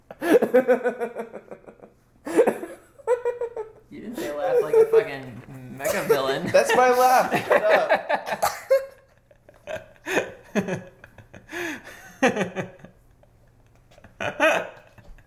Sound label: laugh